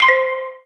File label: Spell Selection; Live Realm